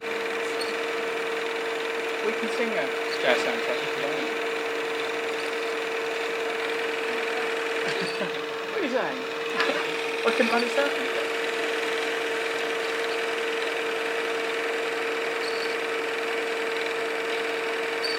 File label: the sound of reels and chat at 8mm cine club; flatpackfestival flatpack event